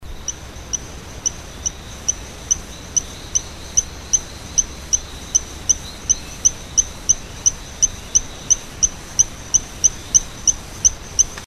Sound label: C flotator, chants